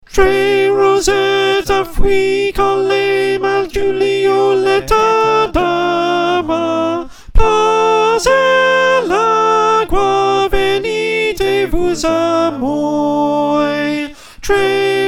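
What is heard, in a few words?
Soprano, choir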